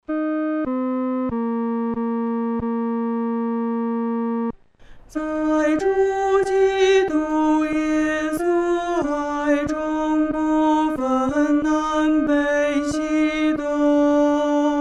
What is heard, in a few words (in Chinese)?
独唱（第二声）